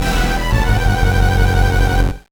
Jingle ~ Dreadful Curse; Shining Force Resurrection of the Dark Dragon Original Sound

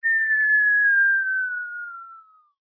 Fall whistle; friday the 13th killer puzzle

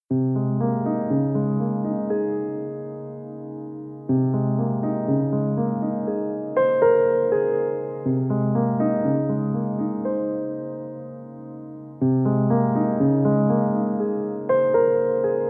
Rainbow Magic Duet, vocal

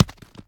stone land; Scrat

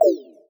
Win Close; hatsune miku the planet of wonder and fragments of wishes; switch windows xbox one

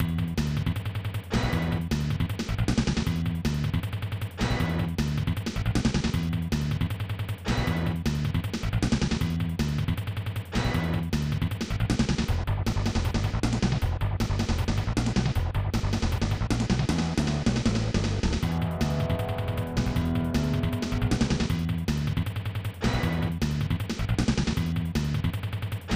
Power Up; GP-1 Part II; SNES

